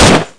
mp5 fire; Soldat

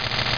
zan walk; zanti

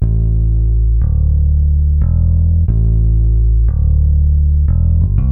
bass G; LiveAct Pool

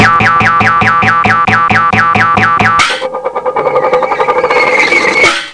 Braking and Sliding; Cartoons

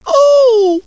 ooh; PUZMANIA

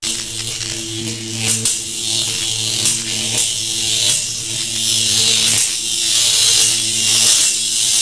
elec spark; romulus; Earth and Beyond; EA Games

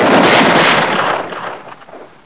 firing

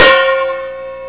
ding; Amiga 8-bit Sampled Voice; SCombat

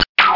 Alien Exp, Amiga 8-bit Sampled; invaders

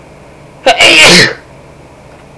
sneezy; People; Sound Library 2000; Wayzata Technology

